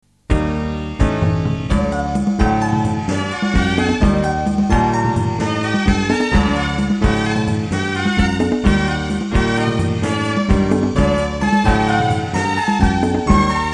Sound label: Midi; 1993